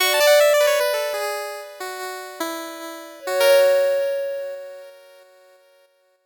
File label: 8bit jingle slow